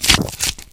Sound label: grub squish, antlion grub